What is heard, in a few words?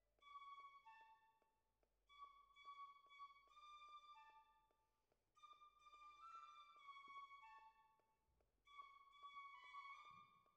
Cellos